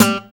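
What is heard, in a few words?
GUI PULL, acoustic guitar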